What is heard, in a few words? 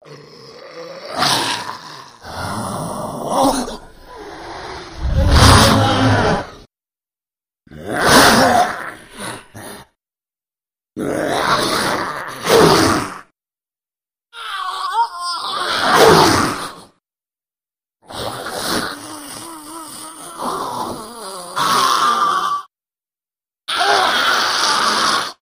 Attack Vocals, monsters